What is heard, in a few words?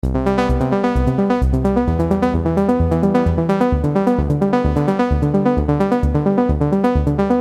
ARP Sawy; sickrate; siik essentials iv